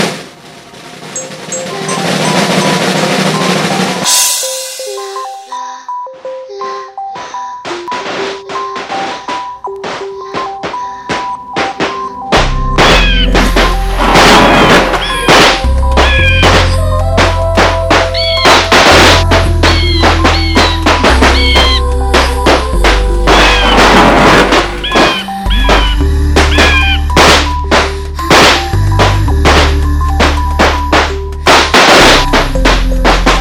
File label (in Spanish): sonido celestial